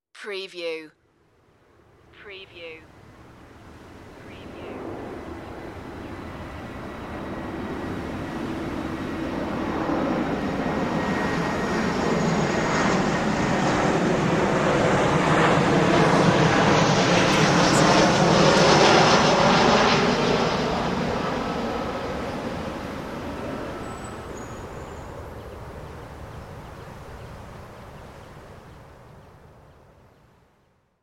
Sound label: airplane flyover